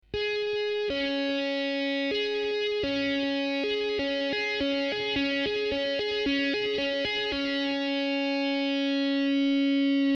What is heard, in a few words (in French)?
simple, guitare